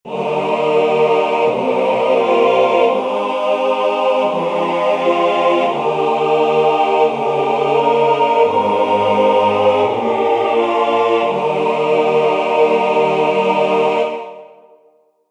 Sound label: three fold amen